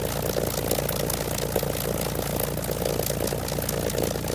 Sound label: engine plane rpm00 close; War Thunder